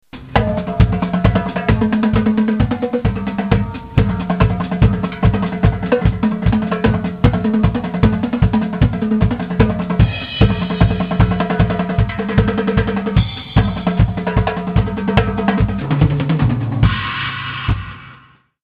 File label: octobans; percussion; drums